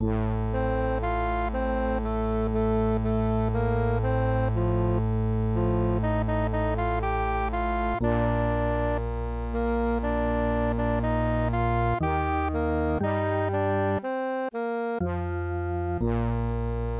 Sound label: MIDI; BWV10